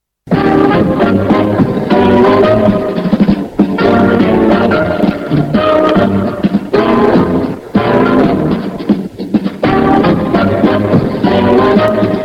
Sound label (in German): Radioherbst Jingle